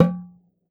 oxaca, percussion; ILIO

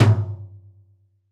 Lite Tom, Roland BK-5

